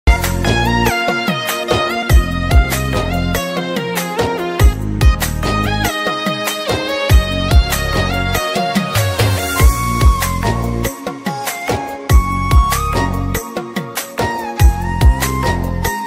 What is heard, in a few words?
new Odia ringtone, Odia song